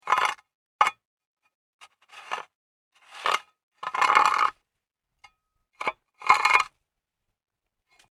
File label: Clearing Snow From Driveway